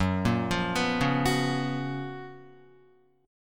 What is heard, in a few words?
Gb Major 11th Guitar; GbM11 arpeggiated; JGuitar